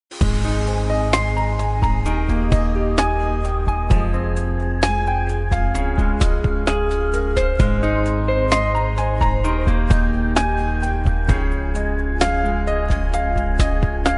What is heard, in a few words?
Best Piano, instrumental